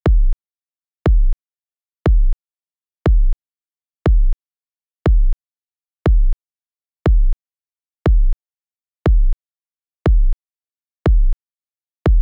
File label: bassdrum; hydrogen